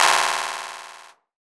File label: CLAP FX